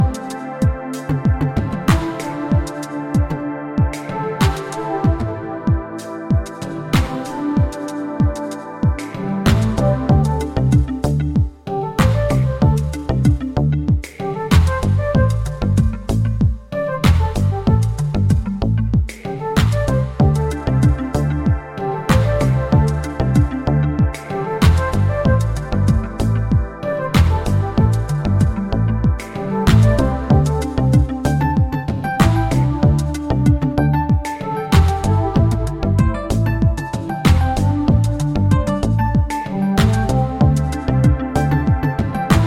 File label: Blues